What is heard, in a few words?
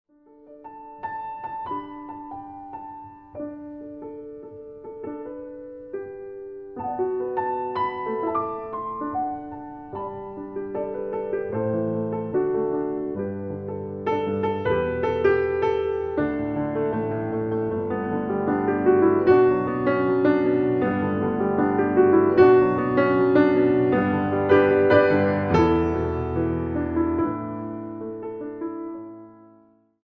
Swedish Piano Songs